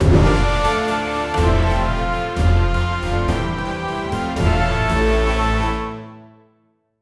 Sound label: epic cinematic intro with, orchestral